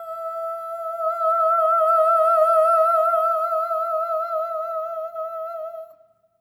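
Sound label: soprano "oh" E5; Spectrasonics; Symphony of Voices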